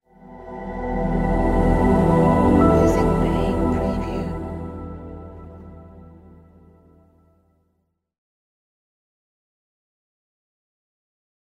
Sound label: Corporate Technology Logo